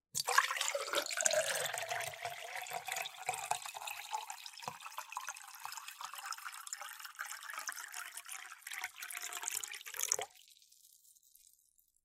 pouring milk; grade3; helping family

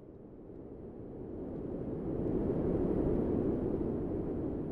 wind blow; matter

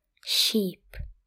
sheep; domestic and wild; eng

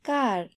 car; grade1; land transportation

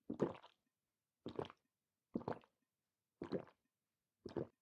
drinking water; grade1

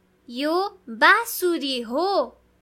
flute; grade1; nepali; kurakani; playtime sounds

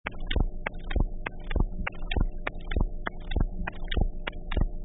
static; grade5; expressing wants

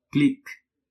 click; grade5; life prc plant new

